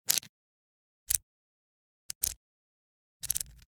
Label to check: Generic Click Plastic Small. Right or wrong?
Right